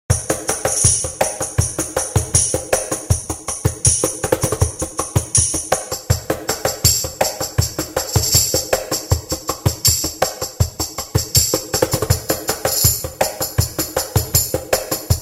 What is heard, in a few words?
all tambourines